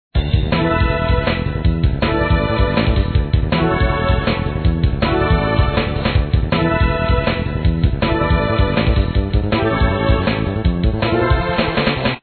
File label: BGM TENSION; POKEMON